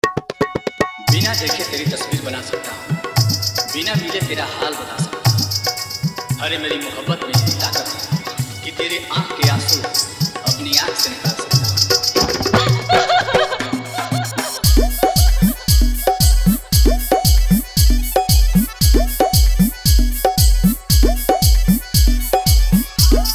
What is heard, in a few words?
Sad Song